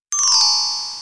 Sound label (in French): SUCCES